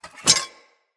Spear Unequip; Weapons; Player

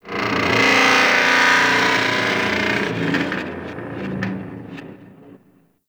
Metallic Creature Growl; Warframe; Tau

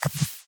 Memory Go Board Unplayable Point; Warframe; Sacrifice

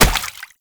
Proto Infested Step Water; Enemies; Steps; Warframe; Brachiolyst

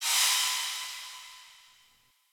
Dist Steam Burst; Venus Solaris United

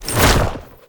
Transition State Foley; Warframe; Tau; Roathe

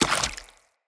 Root Spider Mother Step Water, Spiders

